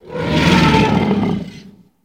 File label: Dinosaur Roar Shrt Ag